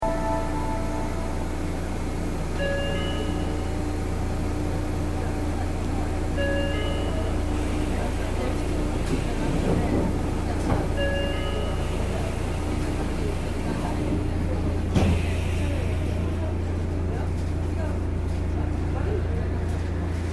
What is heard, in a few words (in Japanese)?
5000系, 神戸市営地下鉄海岸線用の車両です。国内では三例目の地下鉄用鉄輪式リニアモーターカーです。; 海岸線旧居留地大丸前〜みなと元町